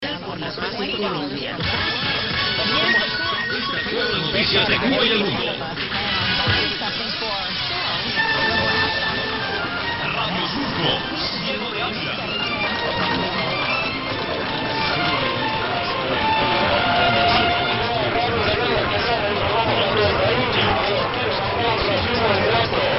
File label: ID; 710 Radio Rebelde w Radio Surco